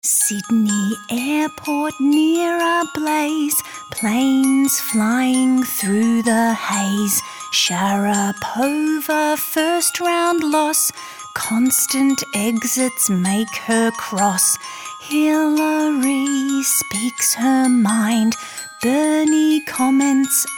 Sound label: Lullaby